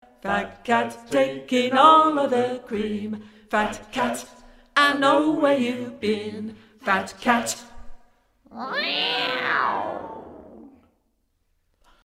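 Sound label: Tenor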